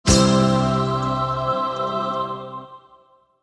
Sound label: Music Stinger Choir; guitar